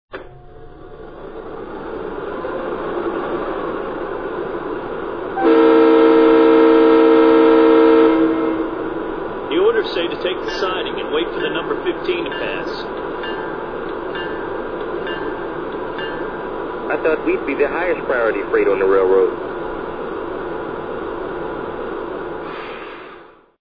E33 E44

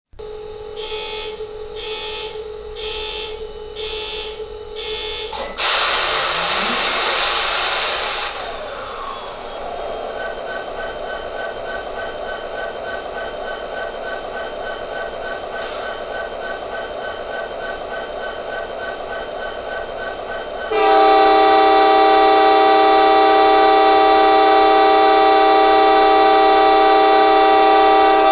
SD70ACE, trains

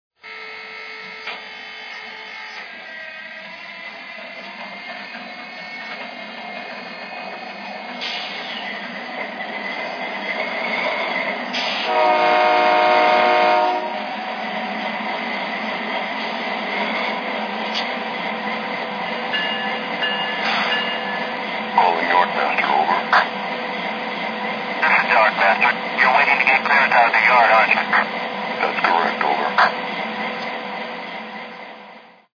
dash8

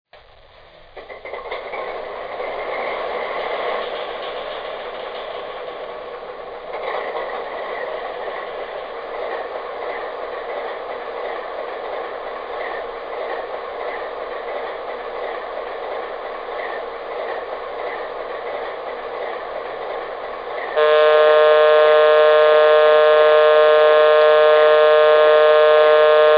RS3

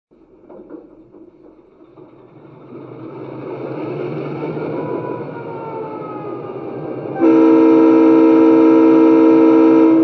EMD F3

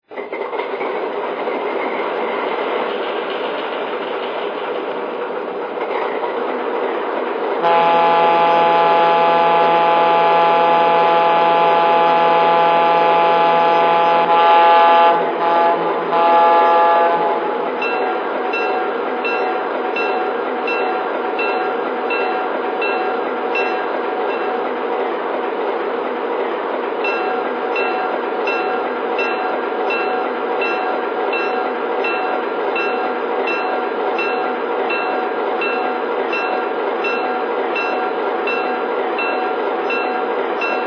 RS11, trains

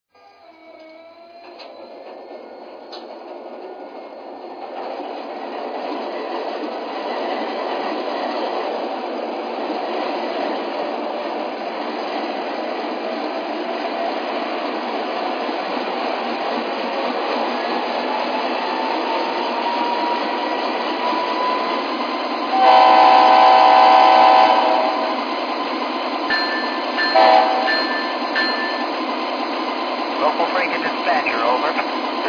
es44ac ge

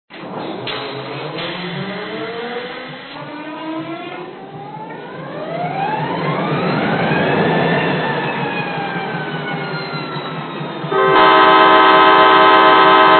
F40PH, trains

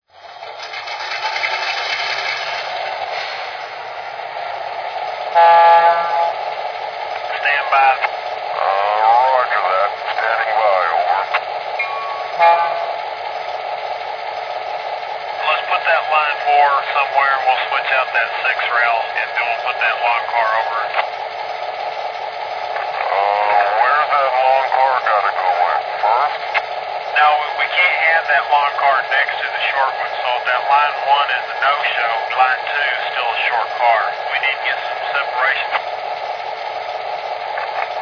sw1, trains

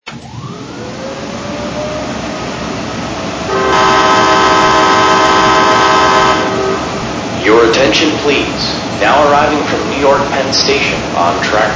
AEM-7, trains

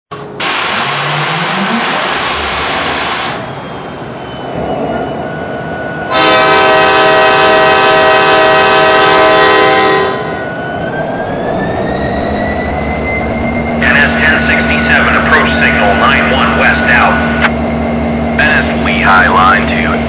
SD70ACe

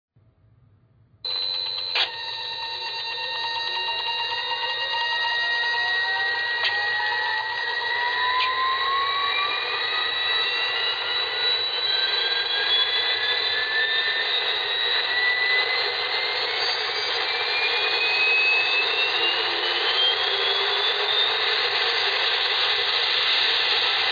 Turbo

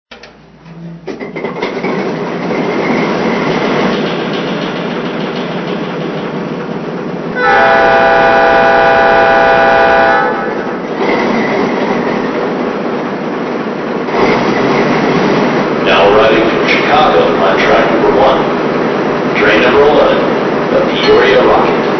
Alco RS-3; RI

